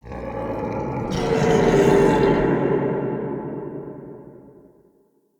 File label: Corrupted Ambient Roar; Vocals; Bromius; Animals